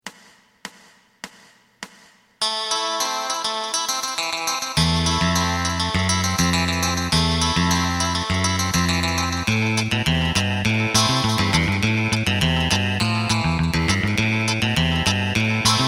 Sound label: drum